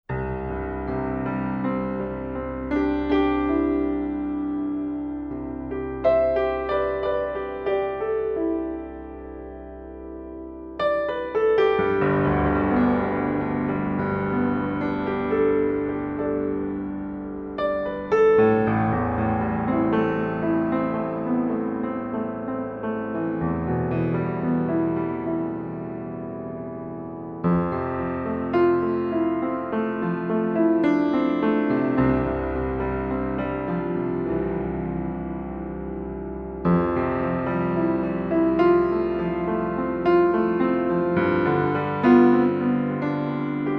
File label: peace mind